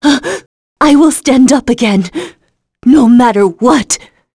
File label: Vox Dead; Scarlet; kingsraid